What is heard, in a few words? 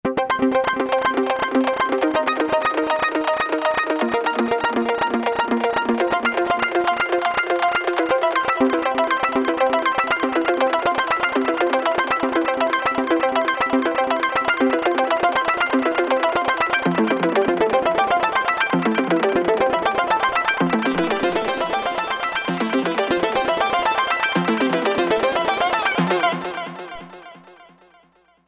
Arp, Novation XioSynth; Novation XioSynth 25